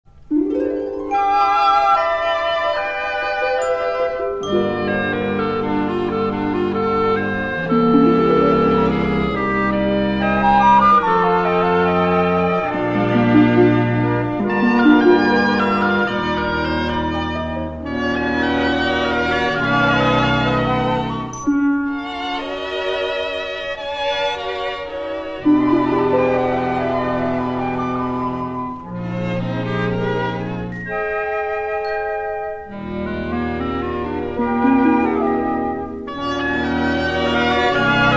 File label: Sixty Second Commercial